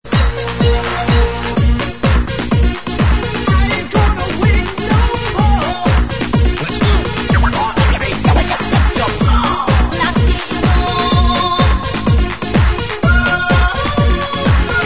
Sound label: party techno